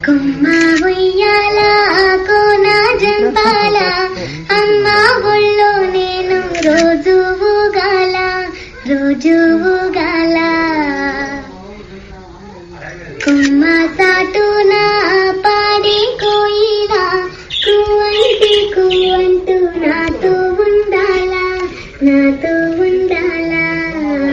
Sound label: female version; rrr